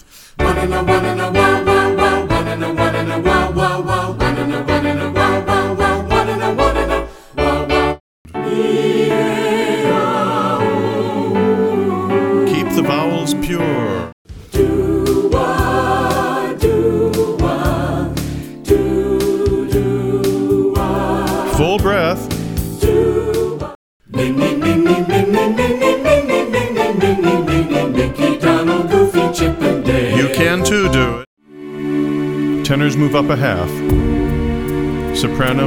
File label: Group Warm Up